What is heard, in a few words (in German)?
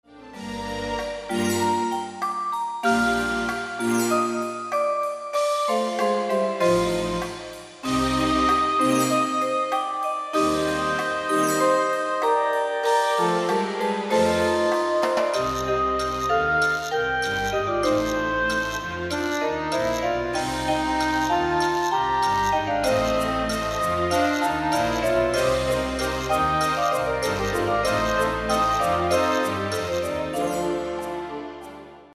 Vibraphon-Konzert